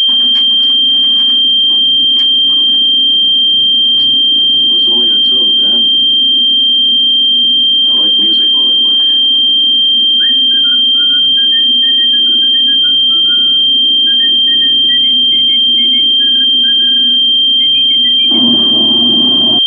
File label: noisy